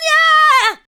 ADLIBS

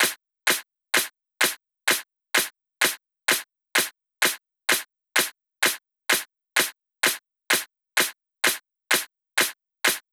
Drum Loop 128BPM Clap; VEC5; Drumloops; Multi Loops

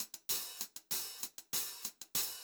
Funk Groove Drumloops, funky; USB Soundscan vol.38; AKAI; 90 s Sample CDs

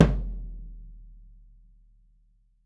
SURDO F, Brazil Drum Perc; E-MU Producer Series Vol. 7 – Old World Instruments

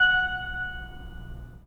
Pipe Mix Celeste, 3D Organs

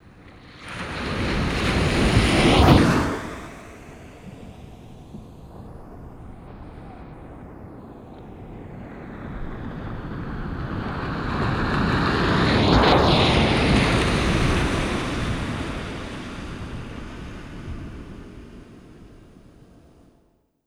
REAL WAVE; AKAI Anime Sound FX; NATURE

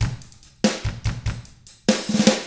rock 6/8, drums; ILIO; Double Platinum Drums 1

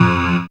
syn, Dance Synths, Trancers; Trancers 2

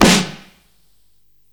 Snare, Psychedelic Drums

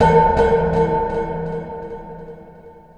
Echo Perc; Sonic Foundry (Sony Creative Software) - Futurist Drum & Bass; Drums & Percussion

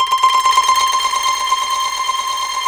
KOTO C4

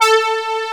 JP PAD, POP

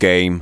vox; Ueberschall; Techno Trance Essentials

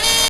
HORN SNIP; E-MU Formula 4000 Series Vol. 5; Protozoa; Phatt Instrmt

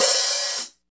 hi-hat real; Hi-Hat menus

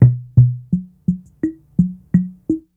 85bpm, Hip-Hop Street Beats; Rancheros; Sonic Foundry; Hip-Hop Street Beats Construction Kit; Street Beats by Poogie Bell

